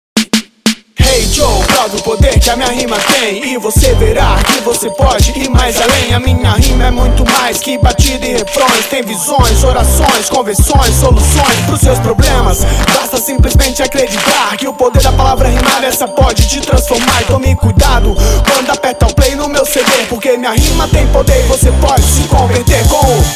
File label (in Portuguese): interludio beat